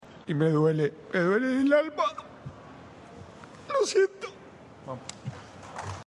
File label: Crying meme; Scaloni